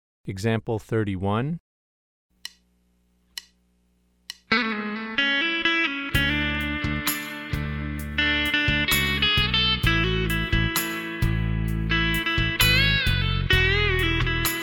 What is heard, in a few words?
Country Rock